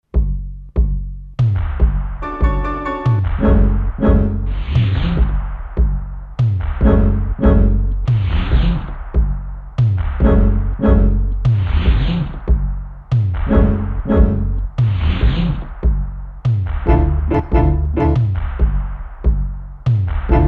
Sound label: Tango, Dance